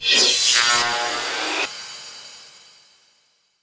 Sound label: Reverse Cym, Cymbals